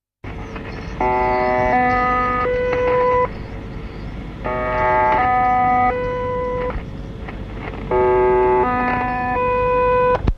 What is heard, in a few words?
3 note interval signal; irdial